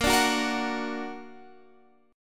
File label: A sharp Augmented